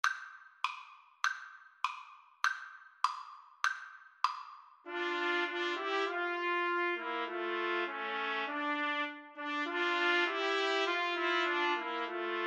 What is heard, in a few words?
Trumpet Trio